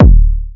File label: big v kick drum; Kicks; single hits